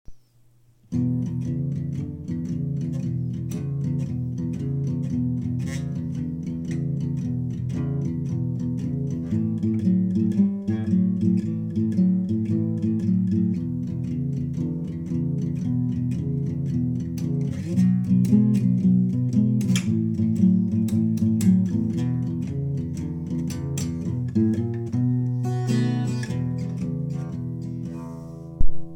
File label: 12 bar turnaround, blues